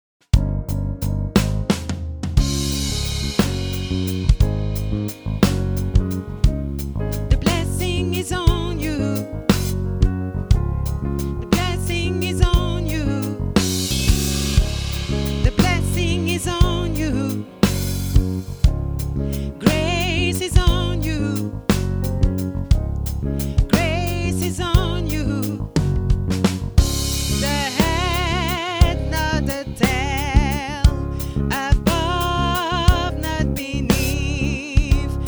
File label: Tйnor, Gospel